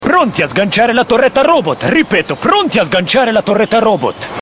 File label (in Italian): torretta robot; ModernWarfare2